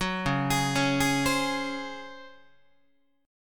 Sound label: Db Major 7th Suspended 4th, Guitar; 9,9,11,11,12,11; 4A,4E,5A,5D,5G#,6C#; arpeggiated; chord shape